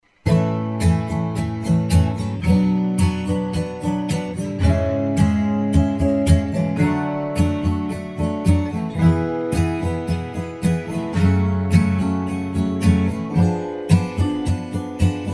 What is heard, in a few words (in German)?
A Dur Akkorde